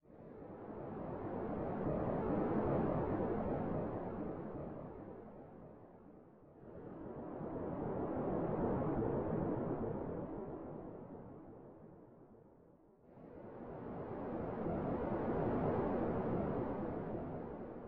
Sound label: Wave Far, Ambient; TowerDefenseGame